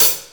smooth hat f sharp key, Royality free hi hat one shot tuned to the F# note. Loudest frequency: 11211Hz; hat samples